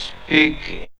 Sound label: VOC, 130 BPM; Ueberschall; Drum & Bass Flash Trackz